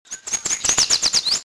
bird; Solitaire

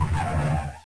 skid; madcars2; radicalplay

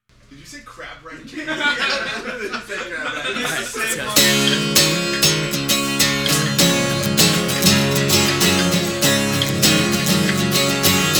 Campfire Version

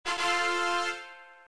level complete; Trijong

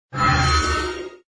special Clicked

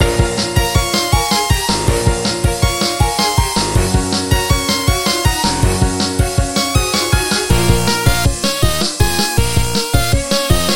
Warhouse BGM

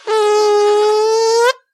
fart

